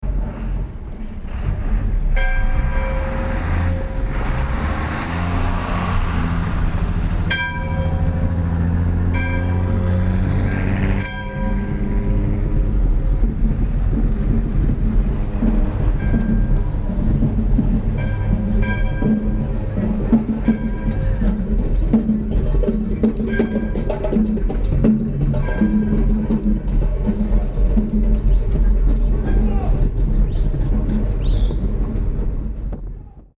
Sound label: drums; juarez